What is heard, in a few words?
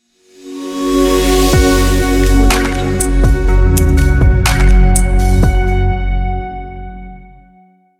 ambient upbeat reveal logo